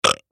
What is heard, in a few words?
burp